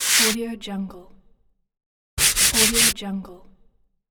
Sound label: brush